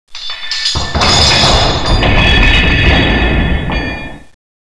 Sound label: clank; ambience; lastmonkey